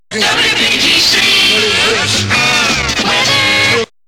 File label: jingles weather